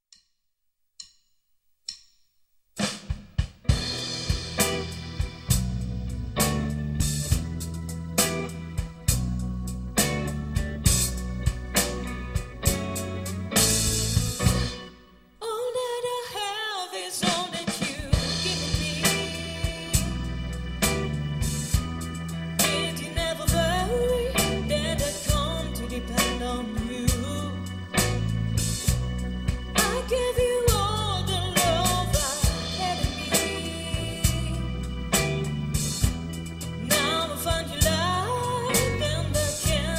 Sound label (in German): quintett